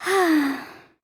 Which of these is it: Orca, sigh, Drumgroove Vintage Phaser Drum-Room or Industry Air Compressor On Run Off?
sigh